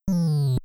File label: Knocked Unconscious; mother1